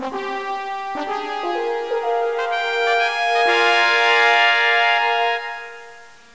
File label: fanfare